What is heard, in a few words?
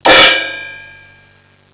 cymbal, percussion instruments; Sound Effects for Multimedia - Volume 1